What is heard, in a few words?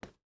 footstep barefoot wood walk; Rust